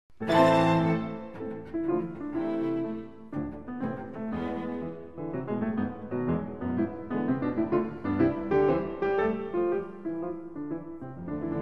klavierquintett satz

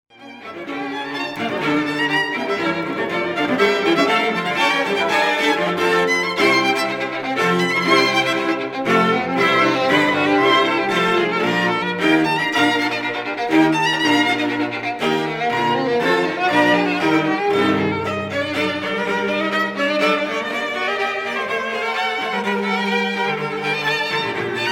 Streichquartett

